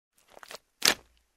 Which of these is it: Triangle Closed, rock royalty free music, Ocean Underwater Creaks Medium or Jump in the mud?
Jump in the mud